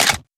rifle clip in, weapons

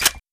rifle slide forward; br85

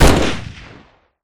fire, weapons

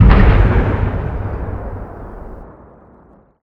tyrant f

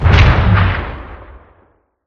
tyrant n

